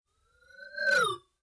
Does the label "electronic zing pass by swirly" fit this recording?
Yes